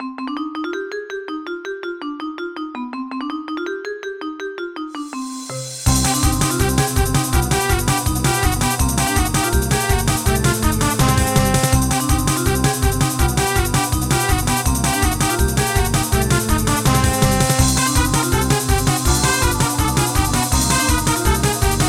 Bonus

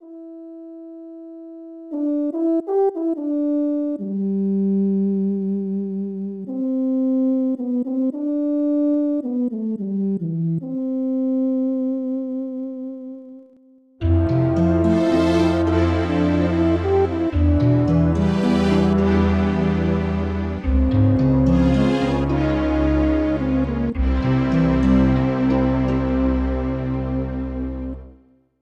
Peaceful; Dual Orb 2; SNES

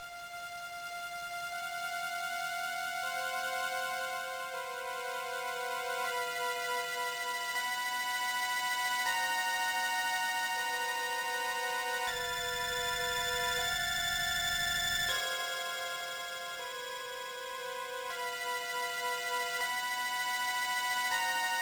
Environment Sound (Disquieting); Metroid Fusion 2002 GBA

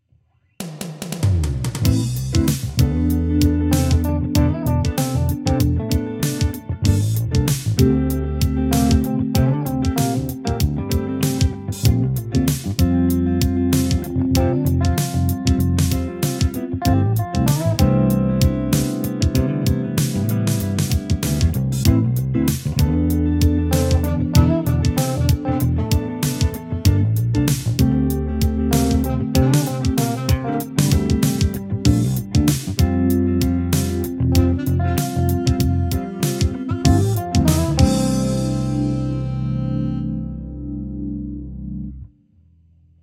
Smooth Jazz

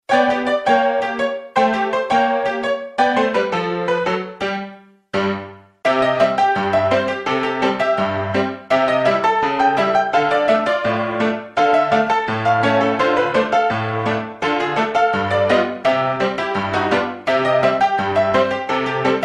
Tavern; Strong World D; Android

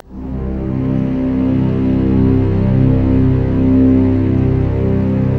sounds music; Halo Combat Evolved; Xbox

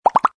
Loot Drop; lego ninjago rise of the snakes

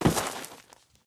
Body Landing dusty; friday the 13th killer puzzle

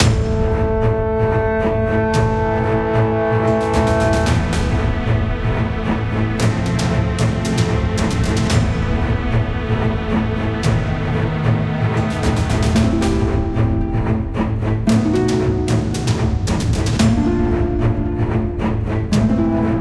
Dungeon; Dimension Cross; Android

